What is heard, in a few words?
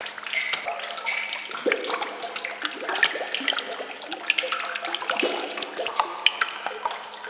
water dripping loop; ninjago